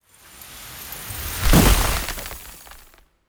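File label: ice crystal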